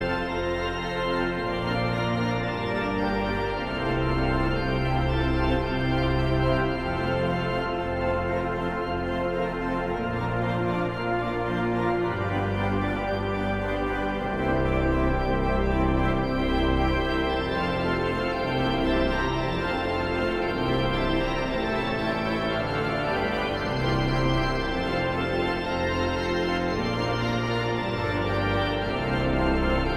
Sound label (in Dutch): instrumentaal